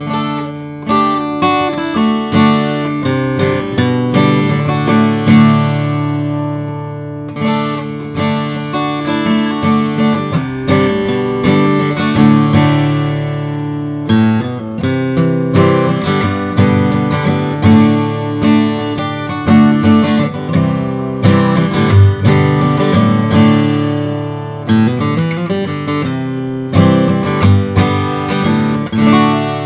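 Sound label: bourgeois country boy om adirondack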